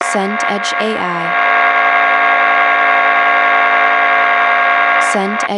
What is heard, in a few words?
interference hum